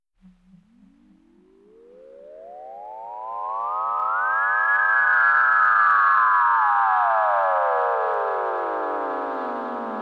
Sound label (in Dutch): Ufo, science fiction effects; KMP Film Video Sound Effects